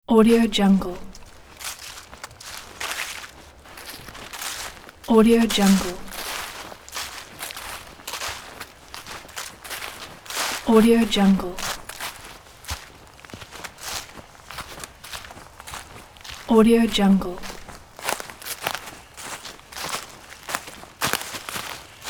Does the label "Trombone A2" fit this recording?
No